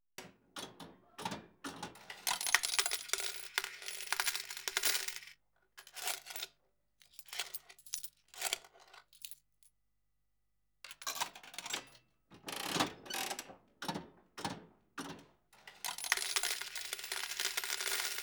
Slot Machine Winner

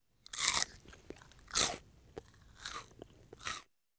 Bite Chew Crunch

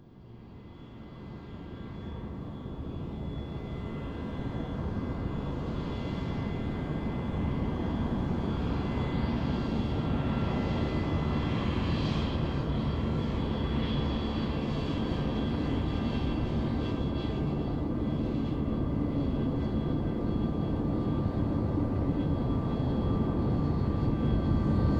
Jumbo Jet Takeoff; Jumbo Jet Takeoff 03

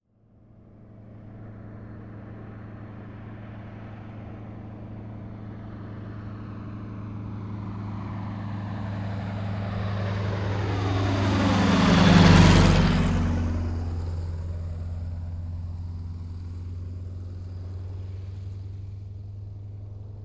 Prop Plane Low Slow By

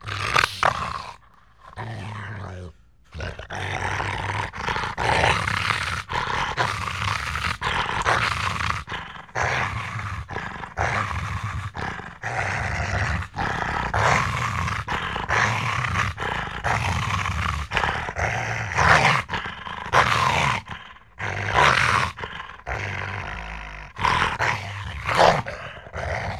Gorilla Snarls

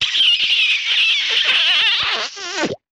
Kiss With Pop; Vintage Cartoon; Comedy Vocals

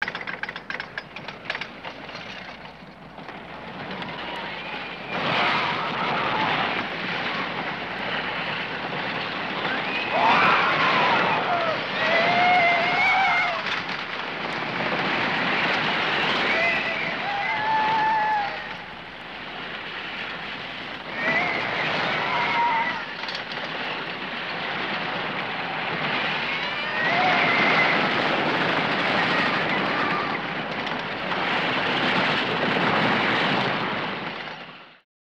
Roller Coaster

